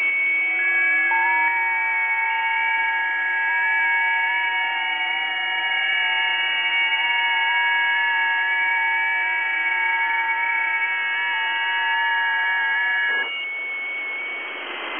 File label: websdr test, ft8